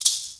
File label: MARACA; Greytsounds VOL-1; LATIN PERC